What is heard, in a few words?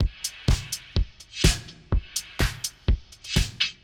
Meseta 125, drums loops; RoBKTA Sample Pack Supreme